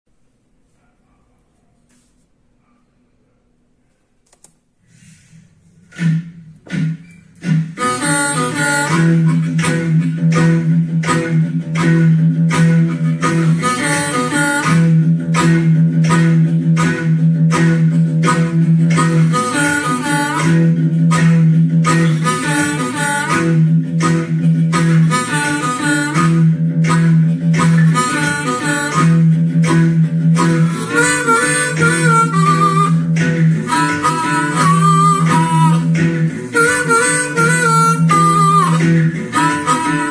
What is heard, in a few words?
Harmonica